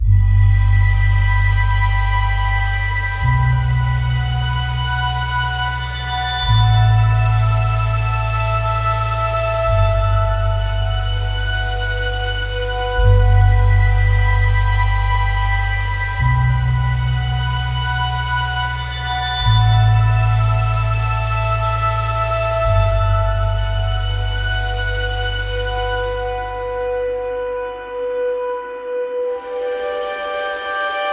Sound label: Bowed